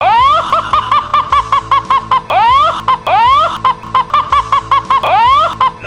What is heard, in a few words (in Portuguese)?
risada; mickey mouse bugado